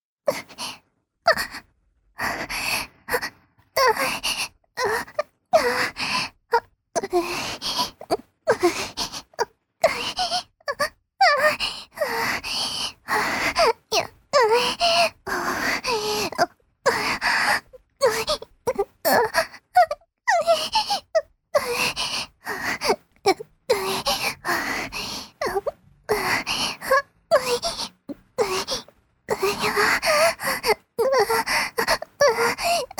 自慰(弱)：性器に陰茎を軽く擦りつける; 実用ボイス抜粋集

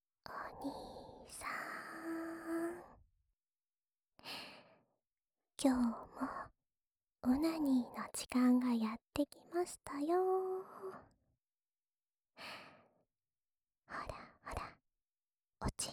オナサポ用耳舐めループ 右側, ASMR

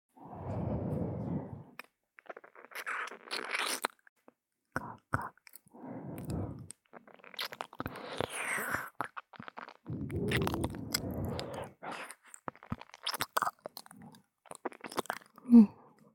左首筋舐め, ASMR

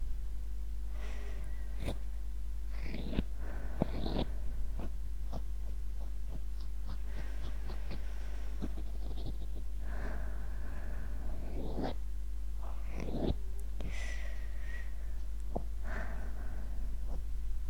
綿棒で耳かき（左）＆吐息, ASMR

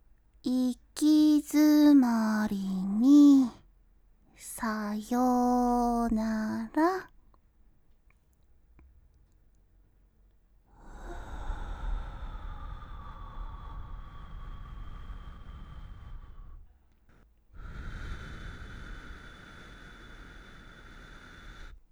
耳吹き; 行き詰まりにさようなら

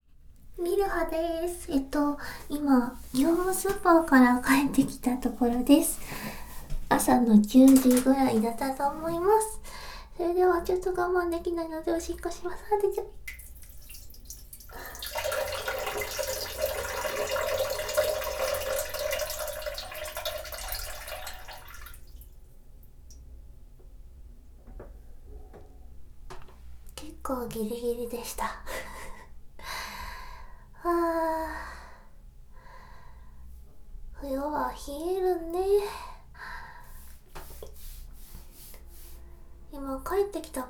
おしっこです, ASMR

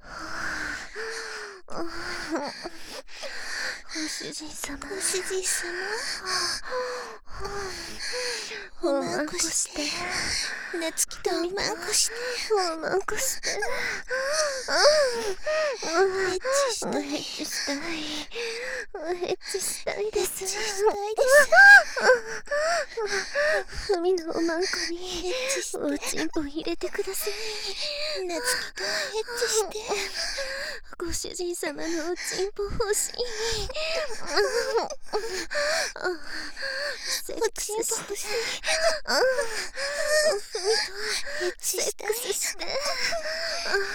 エッチしよ 喘ぎ, バイノーラル; ハーレムオナサポ 4人のメイドがご主人様のオナニーをお手伝いするバイノーラル～文、千曜、名月、莉桜～

